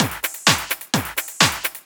Clubhouse Loop 128 BPM

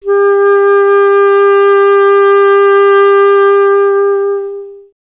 clarinet G; Miroslav Vitous; Solo Instruments VOL-1

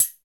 HH HH, Dance

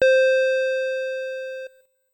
SYNTH Serene Bleep Lowest

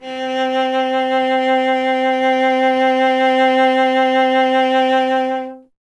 STR cello C, Cello; Miroslav Vitous; Solo Instruments VOL-1

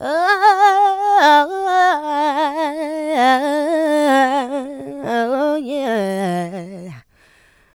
Vocals, Adlibs; Houseworx

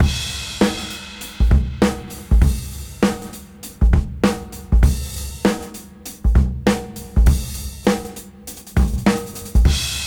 Swing 99 BPM full; AMG; Keith LeBlanc Phat Butt Jams

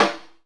SNARE RIM, VINTAGE FUNK; Zero-G; Funky Elements

